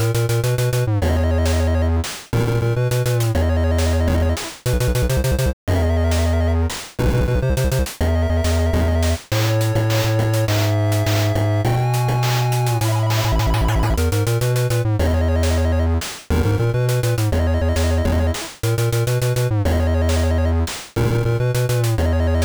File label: chiptune